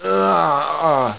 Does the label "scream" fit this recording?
Yes